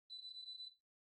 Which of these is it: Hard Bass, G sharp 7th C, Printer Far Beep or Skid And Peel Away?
Printer Far Beep